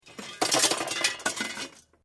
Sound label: Metal Light Movement Minor